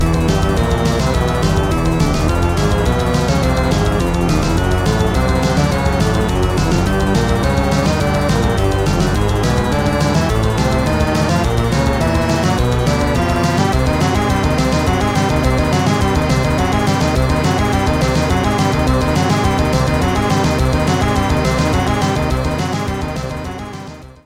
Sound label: Multiball Restart, pinball; Tales from the Crypt; Data East